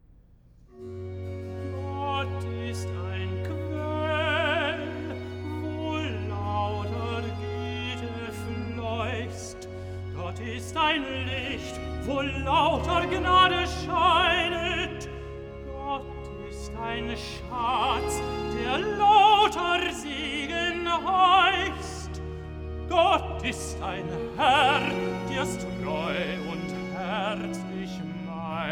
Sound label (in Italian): Recitativo (Tenor); Bach; Cantatas; BWV028; Gottlob! nun geht das Jahr zu Ende; Gott ist ein Quell